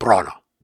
Grunts and Chants, Viking Vocal

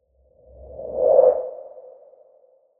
Haunted Whoosh; Whooshes

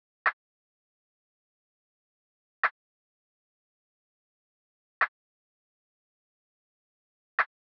Windy Drum Loop - 101 BPM Percussion; Loop Stems; Cymatics; Vibes II Drum Loop Collection

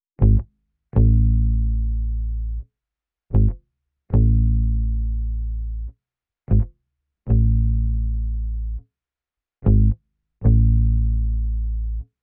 76 BPM E Maj Bass; Lofi; Melodies; Loop Stems; Cymatics; Lofi Starter Pack; Mountains

